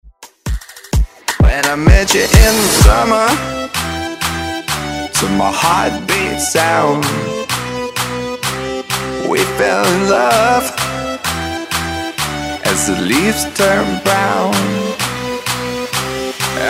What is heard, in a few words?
Afrobeat; Summer; Redrum